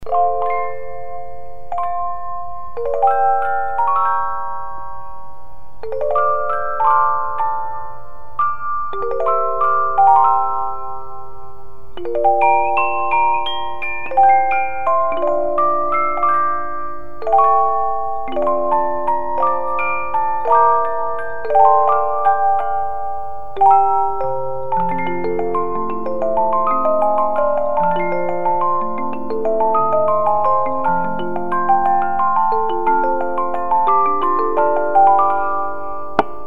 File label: Clair De Lune 2 Parts, 50 Note music box